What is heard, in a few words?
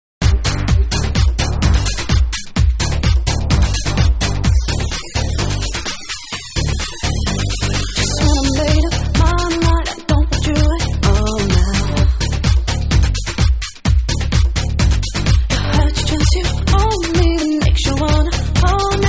Club Vocal